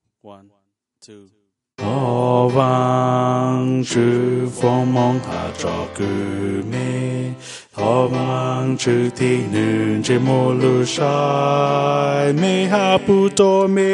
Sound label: bass